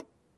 car, impulses; NS Studios - ultra sound collection

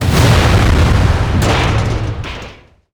Explo Large Metal; Destruction

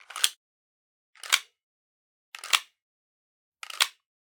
Generic Click Plastic Small

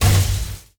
leg hinge; Apotheorasis

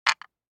DICE on Wooden Tray, Drop, Standard, 5 Five Dice; Samples; ArticulatedSounds; DICE Sfx Party (Extended)

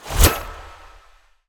Ice Spell Utility Unlocking; Utility Spells; Arctic Aura

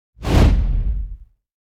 Whoosh Harsh Hit Impact; Hollywood Edge; sound designer's toolkit v2

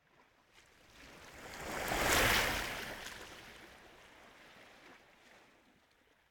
Surf Water Lap Small Wave On The Coast; Hollywood Edge; sound designer's toolkit v2

